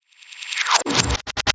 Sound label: Computer Noise Fade In